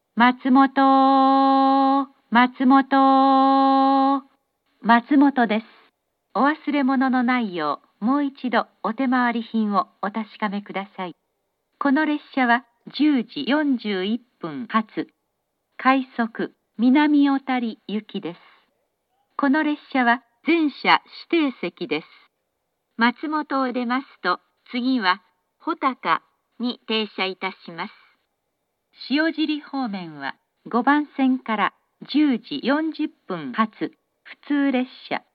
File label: matsumoto rapid minami-otari, station; jr-east